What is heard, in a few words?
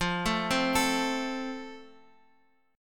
F Major, Guitar Open F; arpeggiated